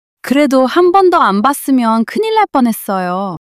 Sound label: surprise